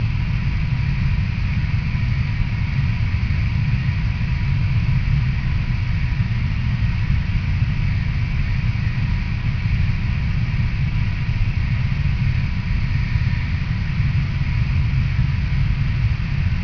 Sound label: facility rumble; gesource